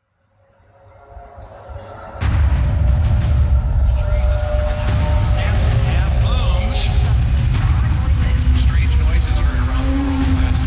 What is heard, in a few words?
the horns of jericho